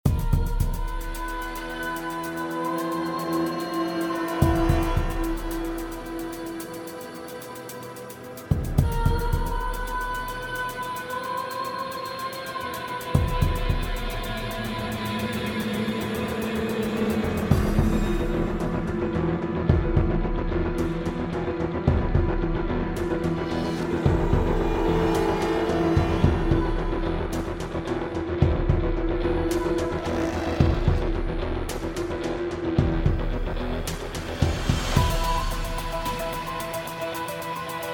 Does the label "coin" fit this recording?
No